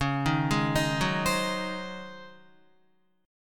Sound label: Db Major 7th Suspended 2nd Suspended 4th Guitar; 9,6,6,8,6,7; 4E,4A,5D,5G,5C,6F; arpeggiated; chord shape